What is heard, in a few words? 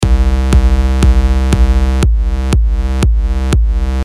Sidechaining Kick